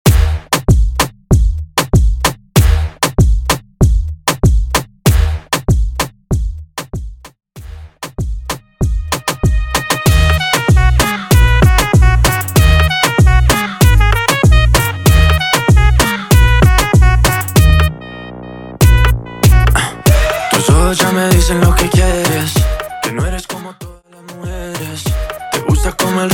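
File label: moombahton intro outro 96 BPM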